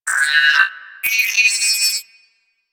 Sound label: Guild Computer Beeps; Warframe